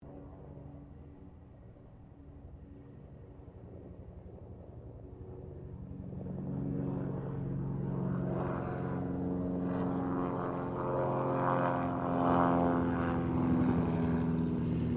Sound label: AIRCRAFT BIRDDOG 0-2 TROJAN PASS; Mixed aircraft squadrons; Airplane; Airplanes; Vehicles